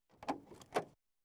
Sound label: Landrover Safari Diesel Door; Farm Machinery; BBC Sound Effects Library; BBC 36